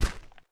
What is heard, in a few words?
footstep; smite; cerberus